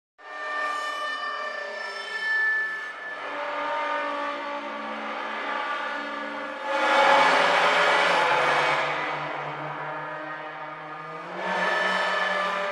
Metal Strains Heavy Long Reverberant Strain, Sci-Fi; Hollywood Edge Foley Sound Library